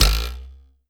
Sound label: spring; thirtydollarwebsite; celeste